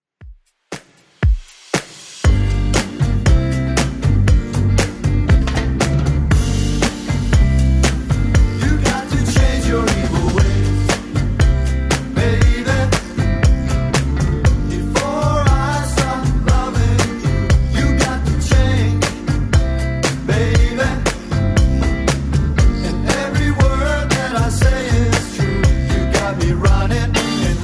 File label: 60s Redrum 118bpm